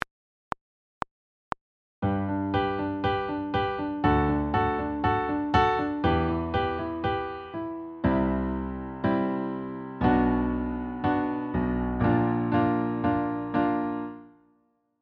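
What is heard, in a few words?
piano 120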